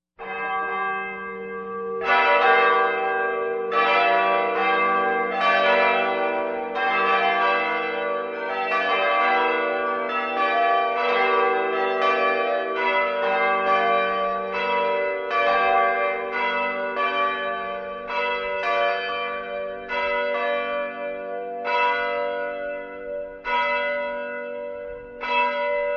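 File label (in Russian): звон колоколов Европа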